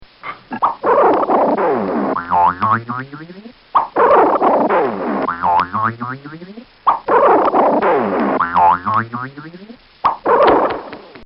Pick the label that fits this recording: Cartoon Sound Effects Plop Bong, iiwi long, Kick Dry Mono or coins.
Cartoon Sound Effects Plop Bong